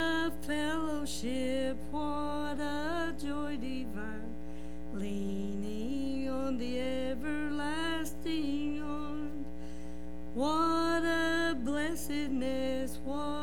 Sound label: singing